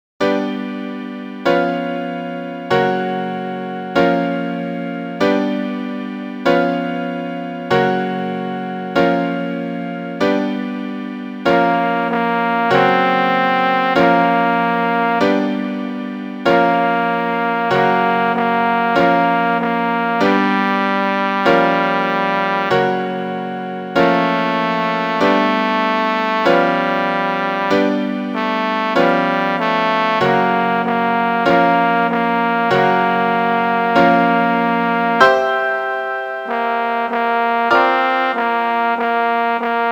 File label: Easter Hymn trom